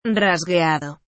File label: rasgueado, ukulele